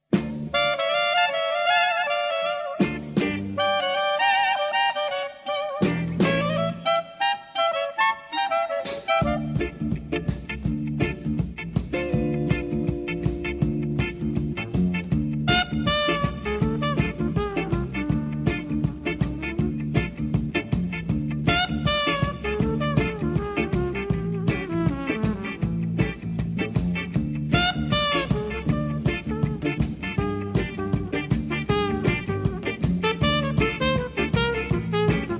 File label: Blues